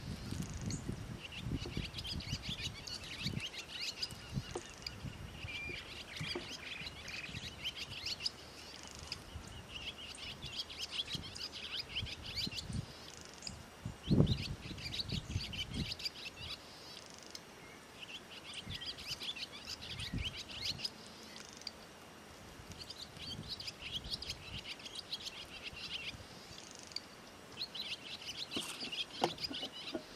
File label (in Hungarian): füsti fecske hangja